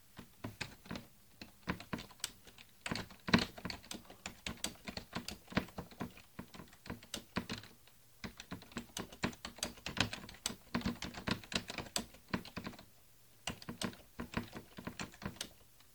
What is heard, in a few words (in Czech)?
Logitech wave, klávesnic; Logitech Wave Corded Keyboard